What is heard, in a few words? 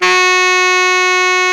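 SAX Baritone Sax F#4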